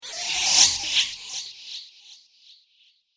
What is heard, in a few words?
Stardust Swish, whoosh sound effect